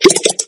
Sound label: upgrade